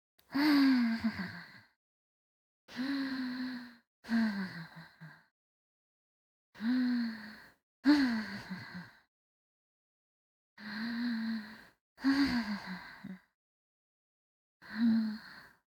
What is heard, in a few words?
Sleep Loop